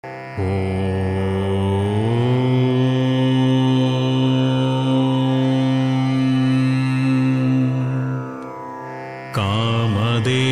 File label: shukra gayatri